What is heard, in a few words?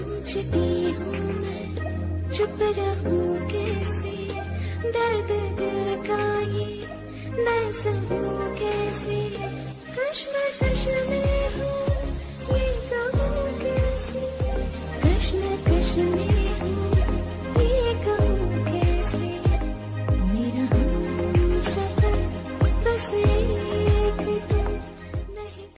sweet ringtone; for samsung mobile